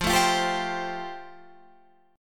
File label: F Major Add 9th, Guitar Standard; Fadd9 strummed; JGuitar